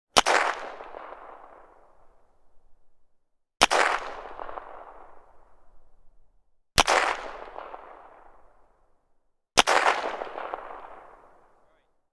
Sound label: gun ppsh; still north media